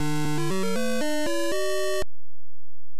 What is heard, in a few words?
Title Beeper; Solomon s Key